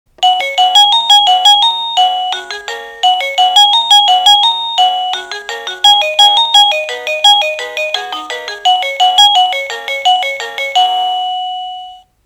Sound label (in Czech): melodie